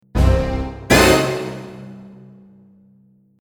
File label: surprise